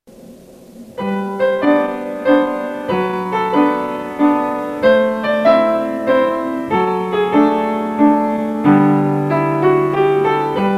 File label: piano